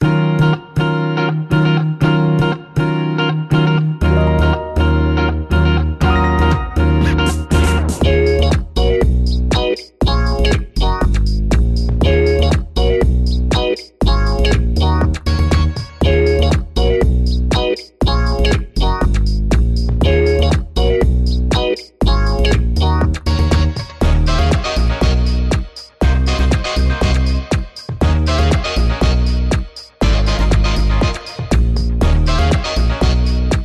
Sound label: Smooth, Jazzy Theme